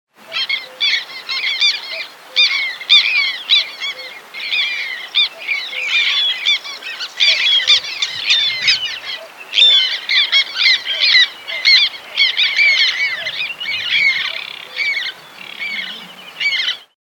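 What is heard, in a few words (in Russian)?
krachka chernaja, black tern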